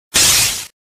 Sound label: plasma shoot